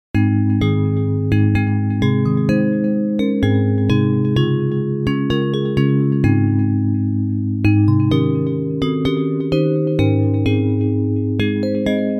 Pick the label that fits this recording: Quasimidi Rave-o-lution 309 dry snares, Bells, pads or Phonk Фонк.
Bells